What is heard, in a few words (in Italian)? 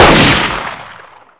sparo, armi